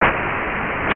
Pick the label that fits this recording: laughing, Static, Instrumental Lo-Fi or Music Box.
Static